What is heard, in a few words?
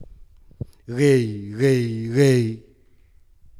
laugh